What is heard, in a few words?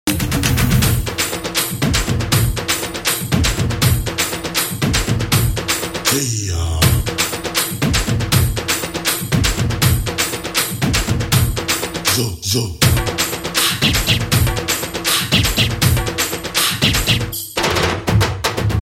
Tapori Beat